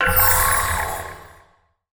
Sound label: Skill Magic; Mother Nature